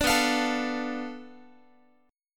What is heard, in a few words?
B Minor 7th Flat 5th, Guitar; Bm7b5; strummed; 17,19,20,19,x,x; JGuitar